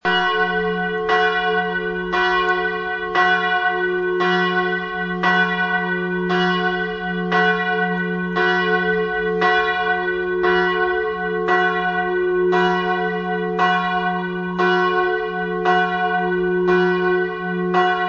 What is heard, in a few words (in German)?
schutzengel glocke; Glocken der Pfarrkirche; gommiswald; Ton: fis; Gewicht: 770 kg; Pfarrkirche St. Jakobus